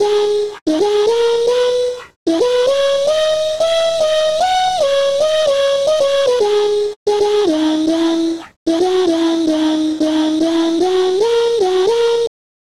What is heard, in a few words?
yay melody, 150BPM; Pony Fan Music Remix Stems